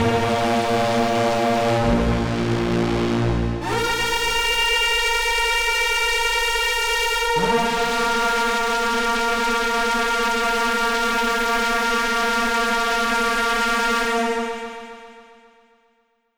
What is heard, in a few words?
Big String